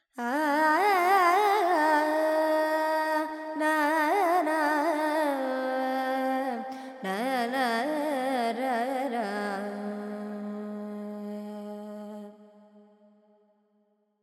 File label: FX Lydian, Vocals, Carnatic Female, Alaps; 70 G; Sample Banks